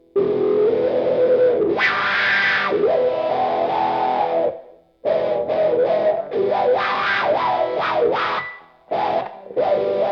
electric; MegaEpix

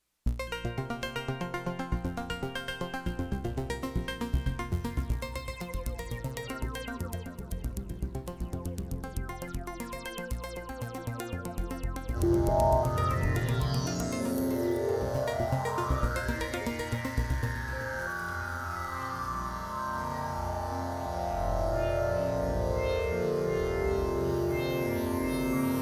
space intro; MegaEpix

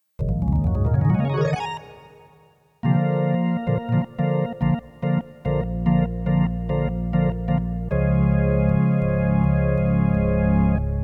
organ prelude

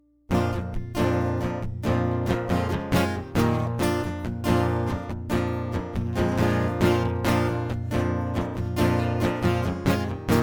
guitar prelude

